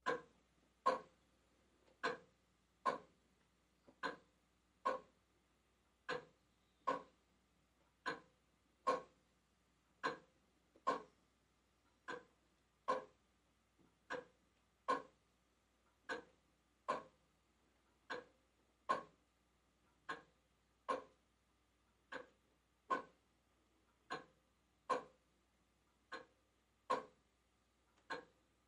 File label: Antique grandfather clock ticking, across room.; CLOCKS GRANDFATHER CLOCK: INT: Antique grandfather clock ticking, across room.